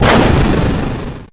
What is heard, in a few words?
swoop